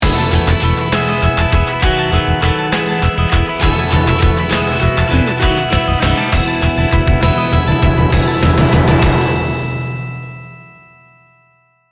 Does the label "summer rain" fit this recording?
No